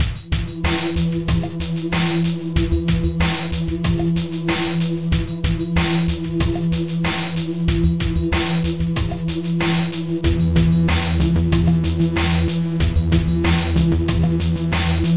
music menu, handheld console; Powermanga

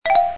typing; E398 phone; mJabber-0.4

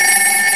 bell ring; Crazy Machines; Играет вся семья

